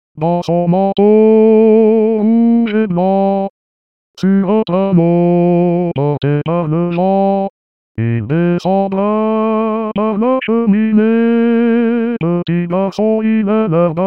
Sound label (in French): Baryton